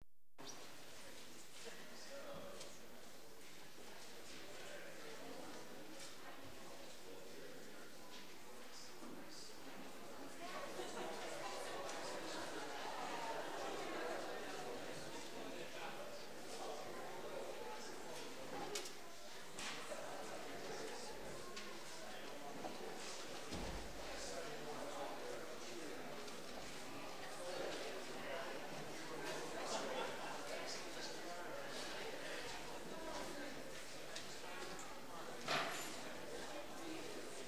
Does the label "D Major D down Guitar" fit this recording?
No